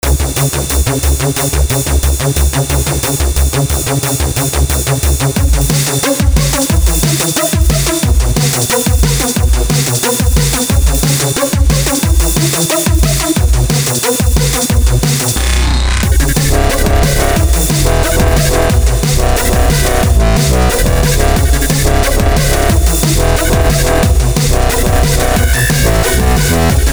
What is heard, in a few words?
Drum and Bass Intro